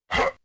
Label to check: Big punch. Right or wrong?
Right